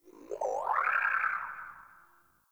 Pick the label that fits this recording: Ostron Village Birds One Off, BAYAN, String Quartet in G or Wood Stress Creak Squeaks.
Ostron Village Birds One Off